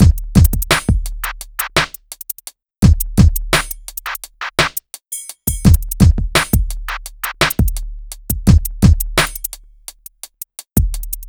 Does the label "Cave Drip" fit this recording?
No